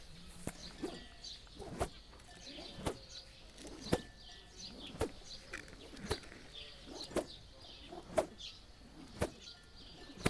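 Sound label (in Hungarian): fej fölött lasszózás, karikás ostor; laszitanya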